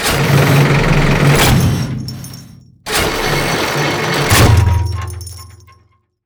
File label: prop crane animated; odin